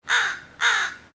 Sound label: Ambient Crow Shipwrecked Lost Island